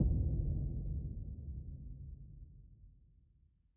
UI Resume Game

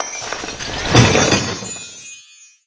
Vault opening animation Sfx

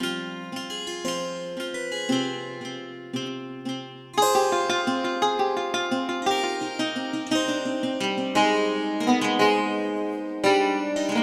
Music Genius String; 7 Mages

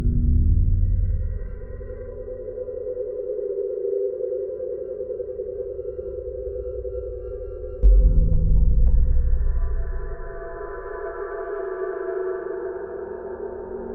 Tunnel Tone

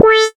Text Feedback

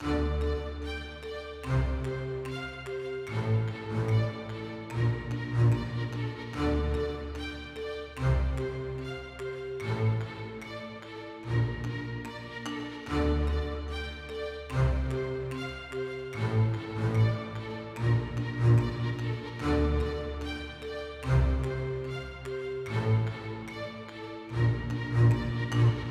Jungle Transition Loop; Teenage Mutant Ninja Turtles: Portal Power

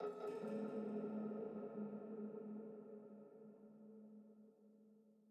Env Field Day Cave; the legend of zelda tears of the kingdom

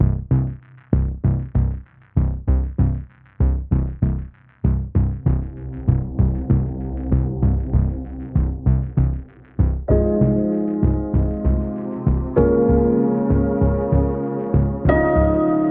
Jazz Loop Start

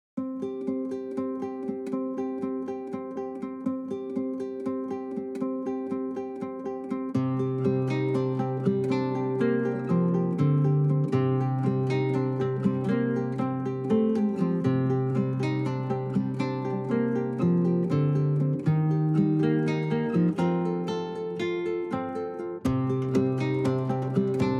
Old Time Battles (Lute)

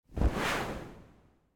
Vulture Dive; Super Mombo Quest

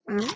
Chew start

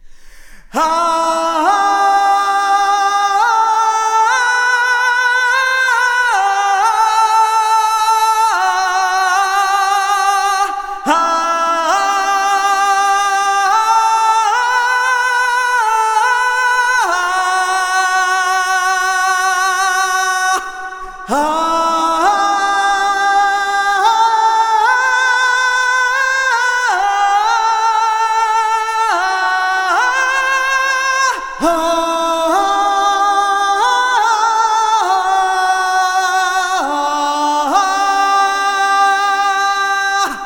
Warrior Song; The Frostrune

